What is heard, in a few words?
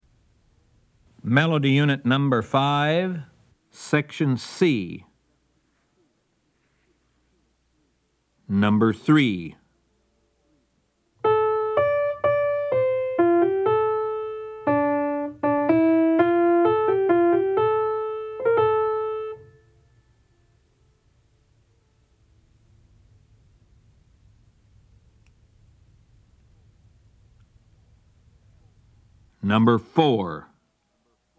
melody, ear training recordings